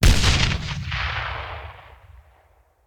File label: Grenade Hand; Sound Ideas; Guns